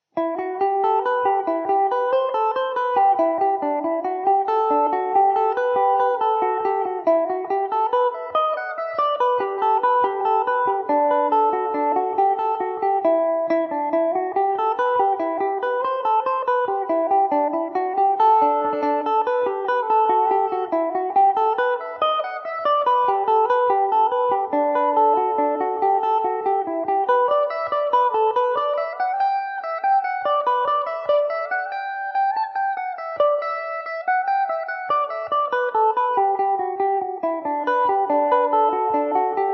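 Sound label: E minor, Irish mandolin; Poor But Happy At 53; Epiphone Mandobird VIII electric mandolin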